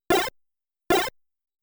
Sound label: Slime Move; The Tower of Druaga